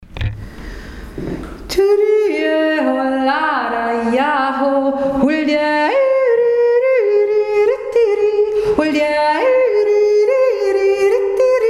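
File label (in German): schuldirndl jodler 2 stimme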